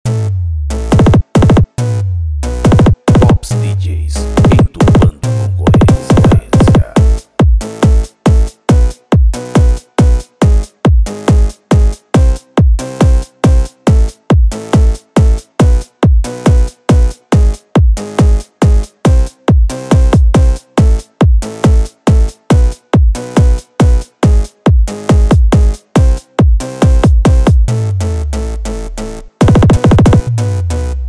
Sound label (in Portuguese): tremolo pancadao automotivo